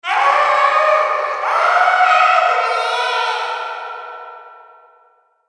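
monster monster monster screaming howling sound cartoon animal sounds call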